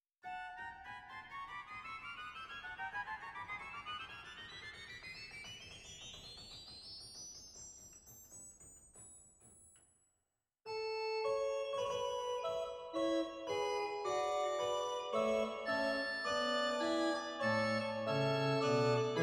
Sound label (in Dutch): Bovenpositief Tertiaan, zwolle